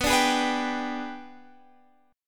Ab Minor Major 7th Flat 5th

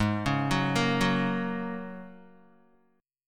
Ab 7th Suspended 2nd Suspended 4th, Guitar; arpeggiated; 3,4,6,3,6,x; 4F,4A,5C,5G,5C,6E

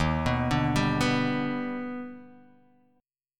D sharp Augmented Major 7th D sharp; x,x,1,4,2,2,1,x

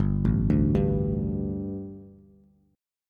G Suspended 2nd G, down, Bass; Gsus2 arpeggiated; JGuitar

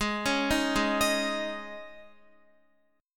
Ab Suspended 4th, Guitar; Absus4 arpeggiated; x,11,11,8,8,10; JGuitar

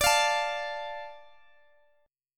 D Minor D Guitar Open G

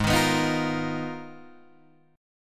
G sharp Minor 6th Add 9th, Guitar DADGAD

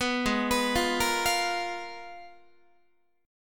Ab Minor 6th Add 9th, Ab, Guitar; Abm6add9; arpeggiated; 14,16,14,15,14,16

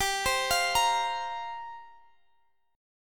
F Suspended 4th G; Fsus4/G; arpeggiated

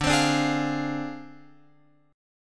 D Minor 7th Flat 5th Guitar Open F

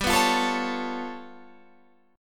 G 7th Sharp 11th G; strummed

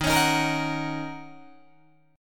D sharp Minor 7th, Guitar, DADGAD

